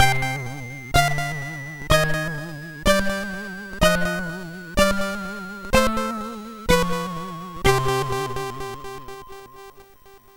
sid MOS6581R2; Alien 8

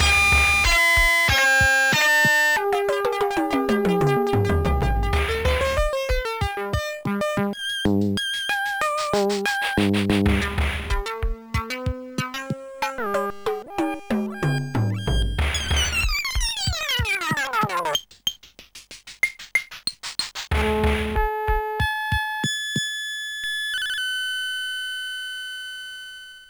Fooling Around; Musicraft

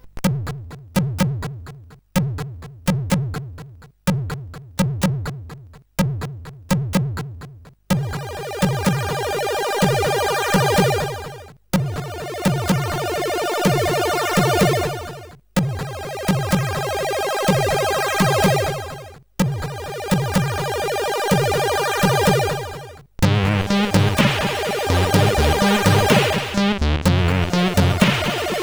Dutch Breeze Advertisement, CSG8580R5

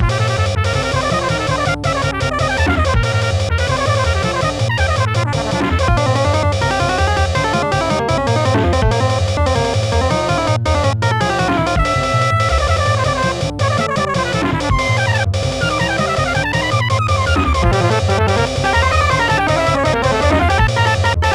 rhythmic flight; Instant Music

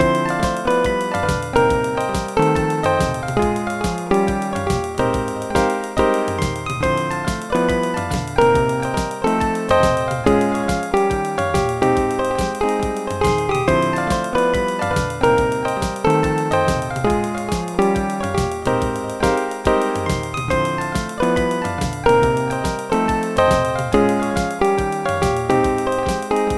solo; Dr. T's MIDI Recording Studio

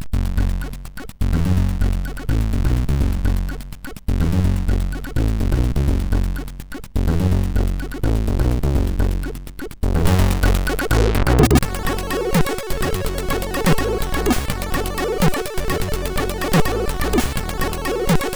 sid MOS6581R2

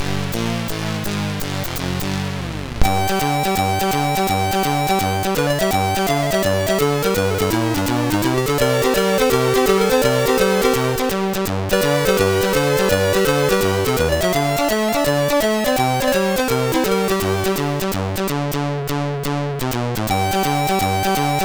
sid MOS6581R3

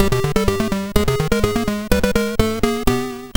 sid MOS6581R2; Keystone Capers

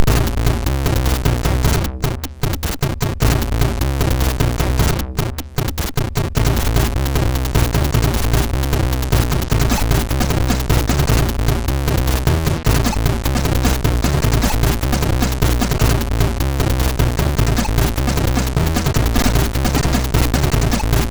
Kick, sid MOS6581R3

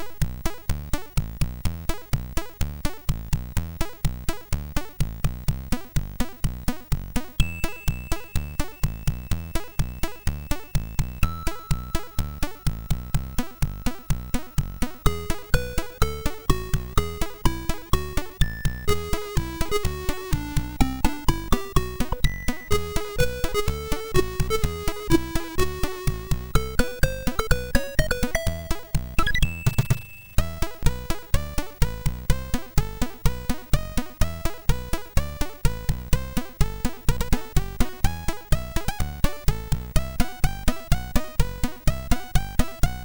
sid MOS6581R2

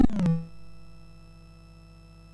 sid MOS6581R4; Movie Monster Game

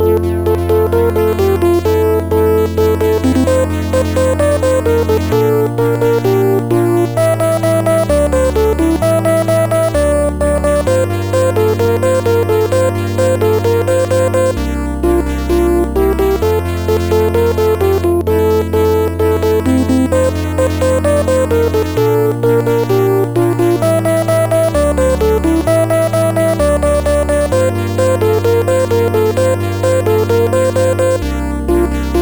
raindrops; Sonix Music Driver

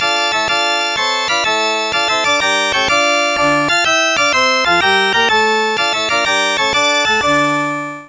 xmas; Musicraft

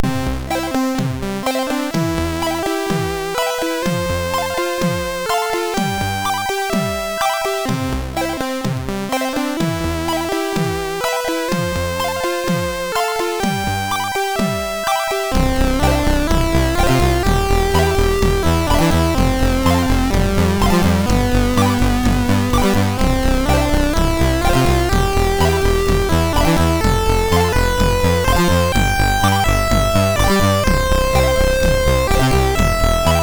sid MOS6581R3; Random

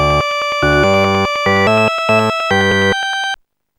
rock; rock fourteen; Instant Music

